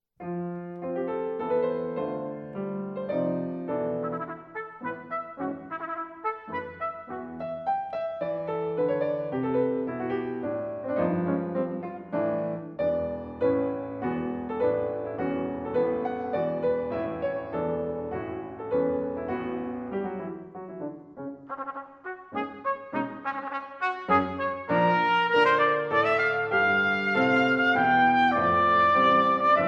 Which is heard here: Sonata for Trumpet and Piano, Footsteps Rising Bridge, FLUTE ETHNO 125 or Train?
Sonata for Trumpet and Piano